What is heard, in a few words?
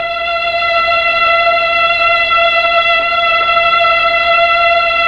STR, Melo.Strings, Slow Strings; Roland LCDP09 Keys of the 60s and 70s 1